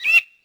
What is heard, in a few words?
bird; Tony Hawk's Project 8; XBOX; cretepark